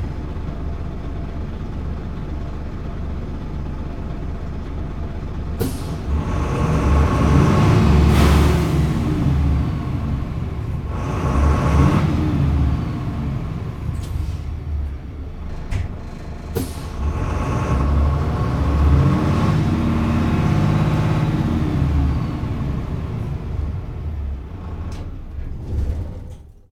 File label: car motor long, street traffic; best service Blue Box